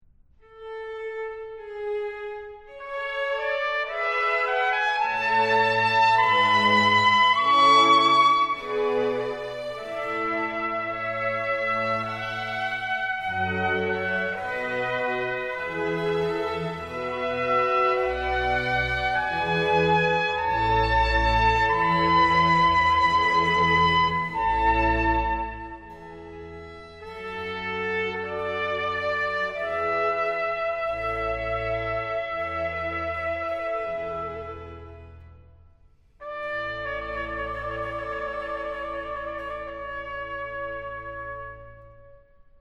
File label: Trumpet; Gabriel's Garden; Torelli - Sonata in D Major - III